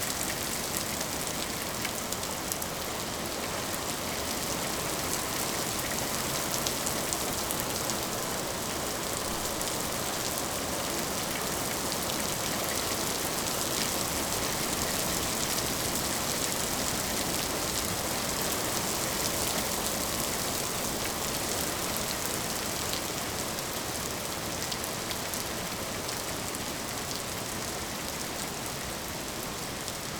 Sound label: heavy rain; A minor